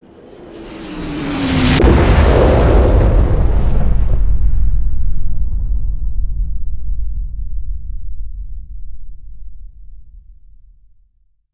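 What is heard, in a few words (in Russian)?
падение и взрыв бомбы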